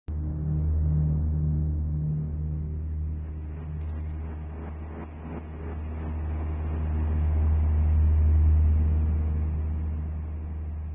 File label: Ambient; Aerial Platform; Call of Duty Mobile